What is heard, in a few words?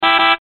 low battery; leapster